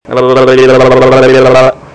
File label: Adult Gone Crazy